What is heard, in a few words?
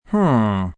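Hmm Pensive male, Reaction, male; Exclamation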